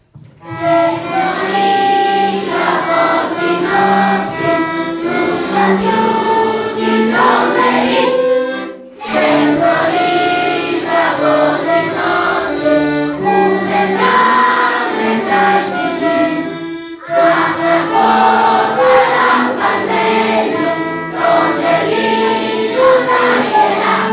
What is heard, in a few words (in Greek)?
Εθνικός ύμνος